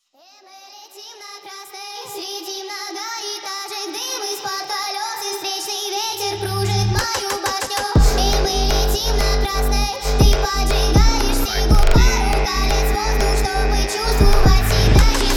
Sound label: Phonk